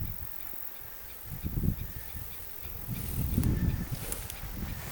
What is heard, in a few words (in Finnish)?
onko lehtokertun huomioaantelya